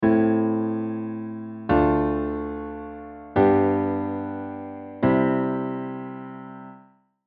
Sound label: Chromatic Alterations